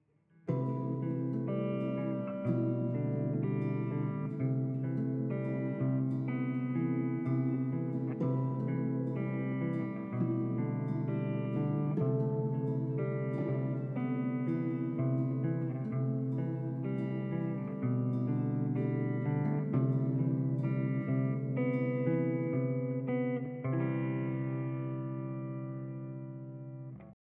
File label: Simple Sad Melody